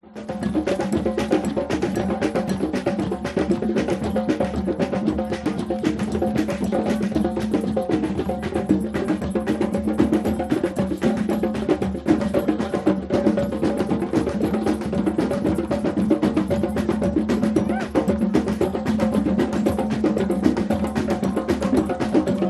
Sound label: drum circle percussion bongos USA Los Angeles Venice